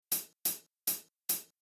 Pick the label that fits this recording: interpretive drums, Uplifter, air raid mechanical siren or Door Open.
interpretive drums